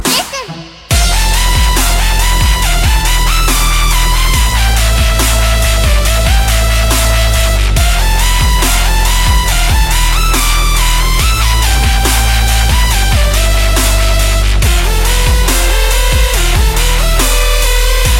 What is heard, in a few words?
Dubstep, ringtones